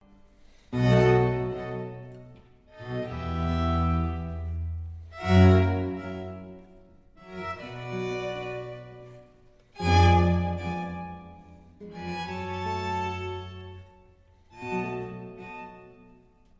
Concerto for Strings in G Major, Alla rustica, Adagio; Vivaldi; Concerti per archi II; Concerto Italiano